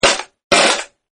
Airsoft Vp70 Handgun Single Drt Fire And Dry Burst Fire; Toy Guns; Toys